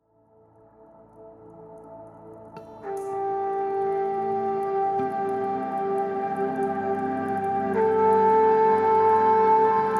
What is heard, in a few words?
Yoga Music, Mother Nature Sound; Mother Nature Sound FX